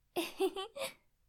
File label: Female Giggle